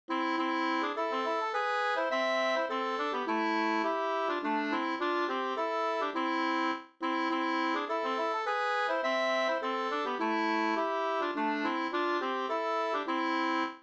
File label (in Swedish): vismelodi